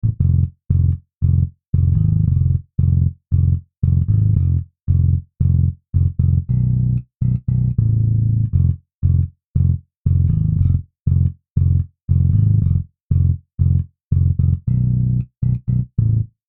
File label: BASS; MODO BASS; Flamebass